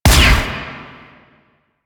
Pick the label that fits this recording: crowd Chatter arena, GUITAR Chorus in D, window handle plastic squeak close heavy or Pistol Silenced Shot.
Pistol Silenced Shot